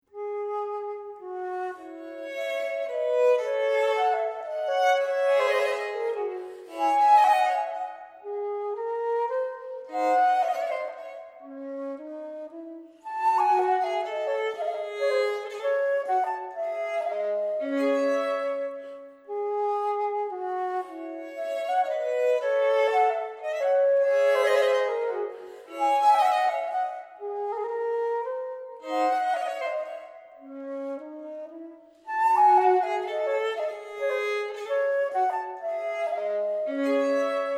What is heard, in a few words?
Sonata 4 in D minor - Aria-Affetuoso, Flute and Violin; Joseph Bodin de Boismortier; Six Sonatas for Flute and Violin - Opus 51